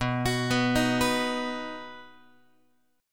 B Major B down Guitar 4A 4E 5A 5D 5G sharp 6C sharp; arpeggiated; JGuitar